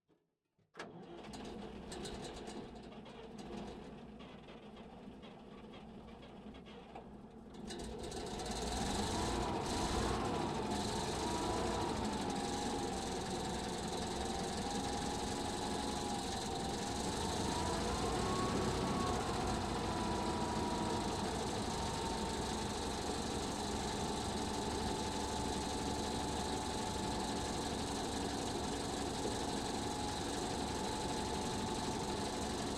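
Hetzer Tank, Onboard, Medium, Drive, Various, Engine; Jagdpanzer 38(t) Hetzer 1944; Pole Position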